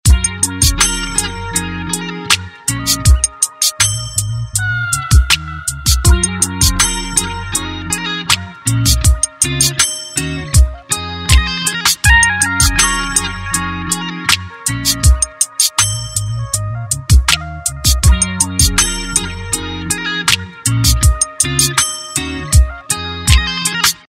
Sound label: Slowly; Samsung; GT-S3600